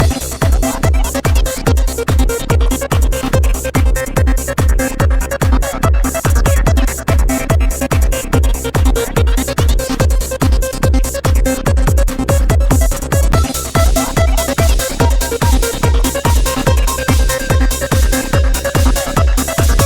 stellar evolution snare

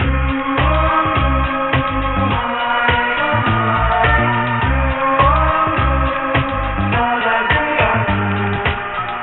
loop

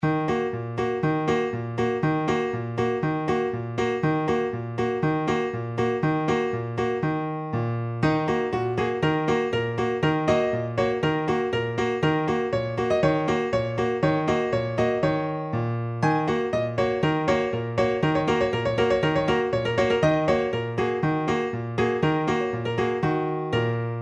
piano

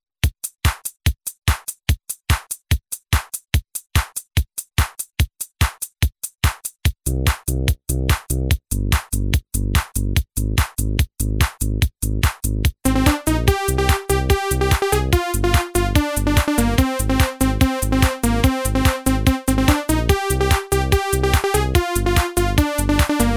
Like, Hip tune

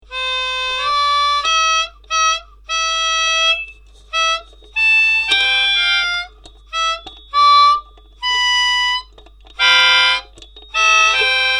trumpet

